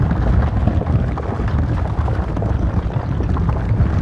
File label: Ability Prison Travel; Evolve; Behemoth